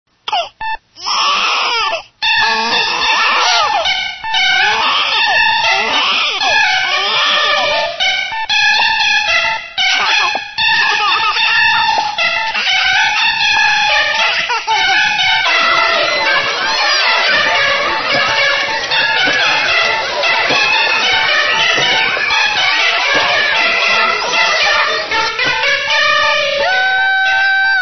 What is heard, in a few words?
static charge